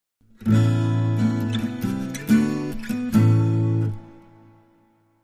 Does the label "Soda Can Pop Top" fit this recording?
No